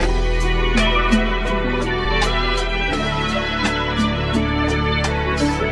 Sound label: keys, jazz loops